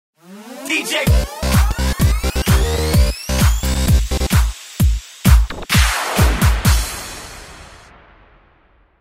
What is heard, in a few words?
jingle radio